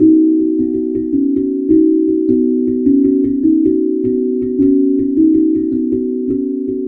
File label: hapi drum